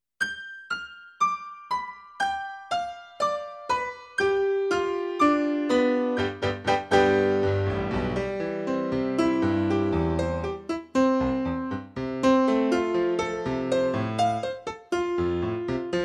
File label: Arpeggio Piano